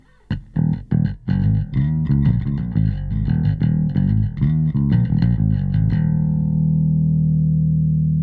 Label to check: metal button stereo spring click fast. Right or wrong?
Wrong